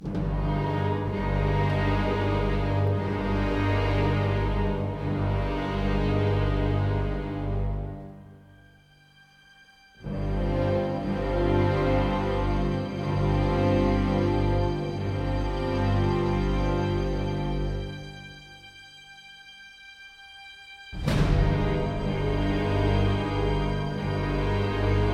Fight Theme Ambient; ryse son of rome 2014

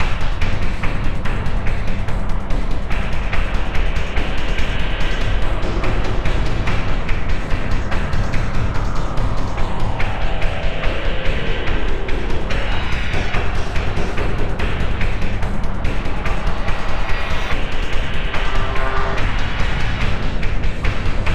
Boss Theme; Insanely Twisted Shadow Planet

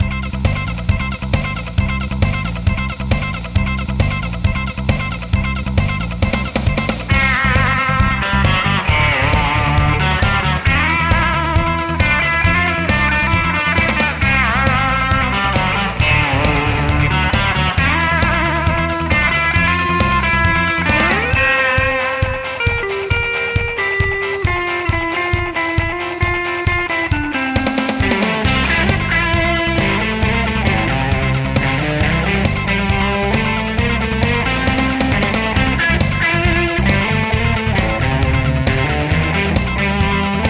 race; Ty the Tasmanian Tiger

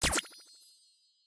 Teleport; Boomerang Fu

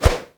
Weapon Dagger Miss; Inotia 3

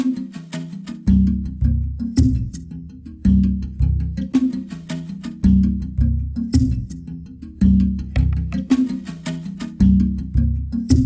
Jungle Level

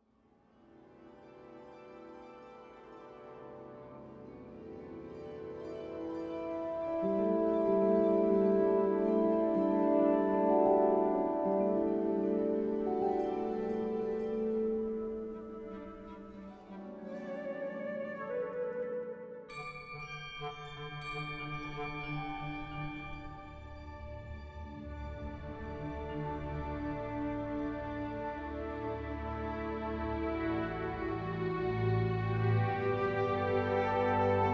World Music

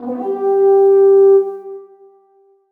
Battle Viking Horn Call Far

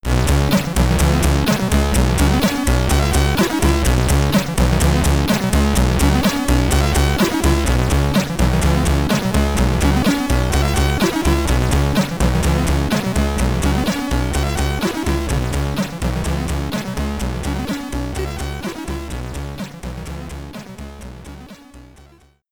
Boss Theme SID Stereo; Space Harrier II; Commodore 64; Mind Quake

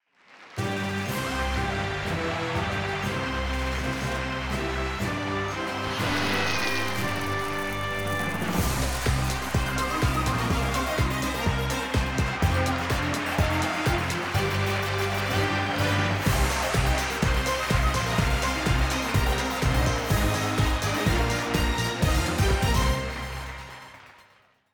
Intro Fanfare

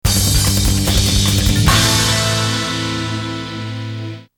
finish - win; southern island

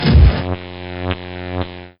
Pulsar; tempest 4000

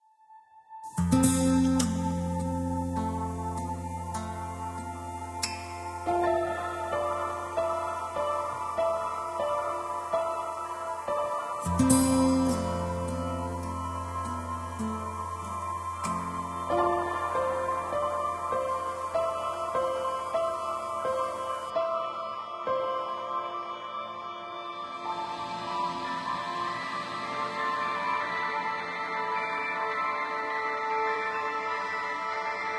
Harbour Sting; Ether One Redux

